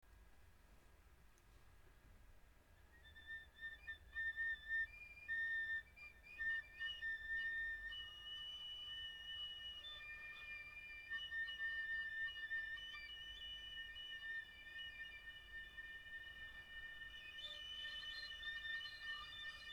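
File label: whistle clicks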